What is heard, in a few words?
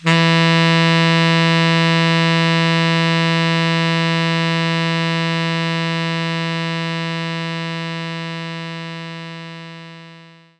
Tenor Sax E2; knights try